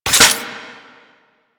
Reload; Gotva Prime; Warframe; weapons

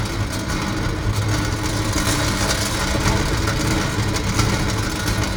Temple Tunnel Door Loop; Warframe; Harrow; Red Veil Temple

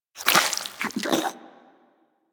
Armadillo Tongue Move; Warframe; Hunting Critters; Foley

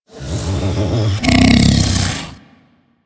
Vocal Breathe Slow, critter; Warframe; Hunting Critters; Undazoa

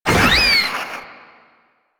Proto Infested Maggot Attack, Vocals; Warframe

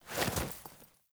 Foley Action Default Roll, Foley Default Clothing; Characters; Player

